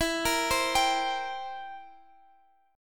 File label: C 7th E